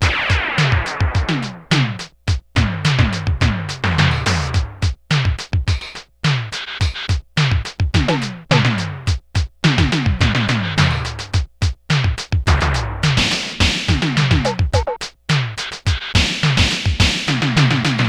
ELECTRONIC DRUMS

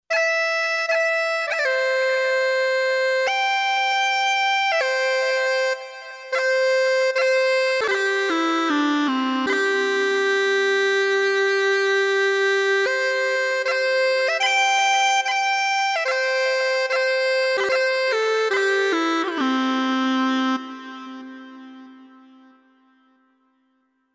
Highland Lead, Roland V-Synth; Factory Patches

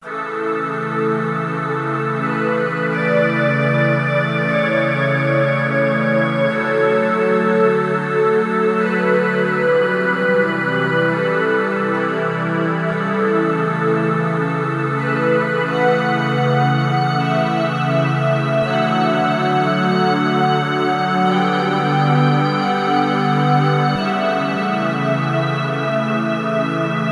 Deep Breath Pad, Roland JD-800; Factory Patches

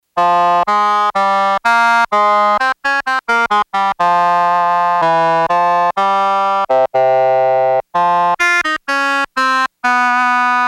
Oboe; Factory Patches; Voices

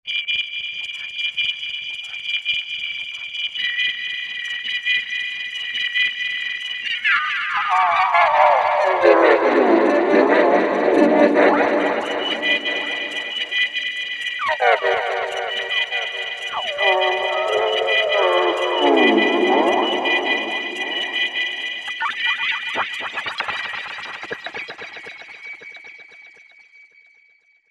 Insect, Roland V-Synth; Factory Patches; DBeam Insect

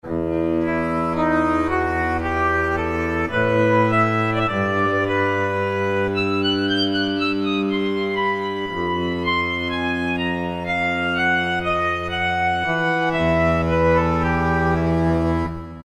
Cello-Viola Piccolo, Roland PN-D50-03

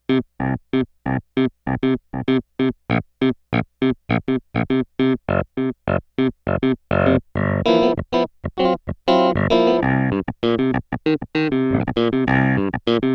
Clavi

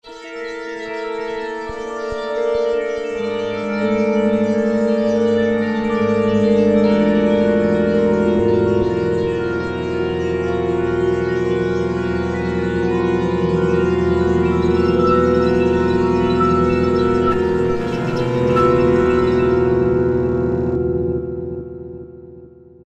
Trolddom, synth fx

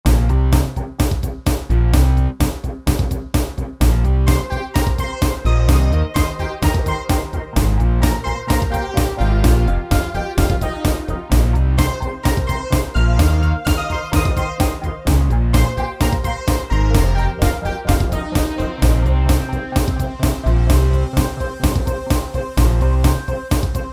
Swirl Dance, synth

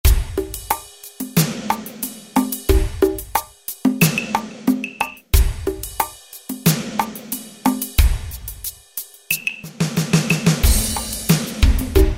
Dreamy, Alesis SR-16

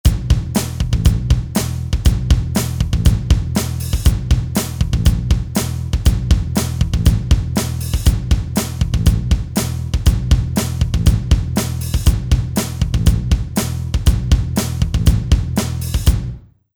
Rock

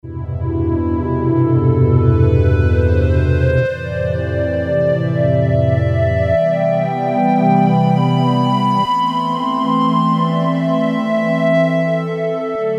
Hollow Pad, Roland W-30; Factory Performance Patches; Factory B

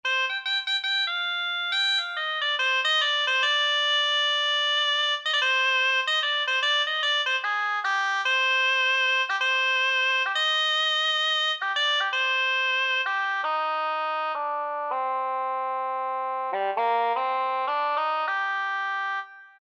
Shahnai; World; Roland SR-JV80-05

